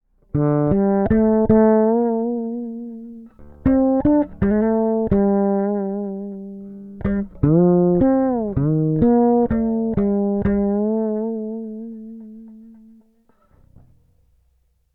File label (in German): Bass Kuma Fretless 5 Melode Single Coil Tonblende zu